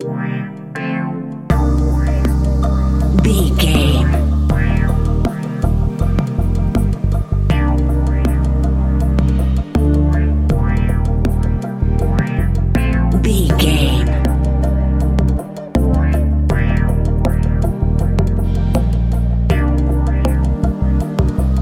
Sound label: tropical dream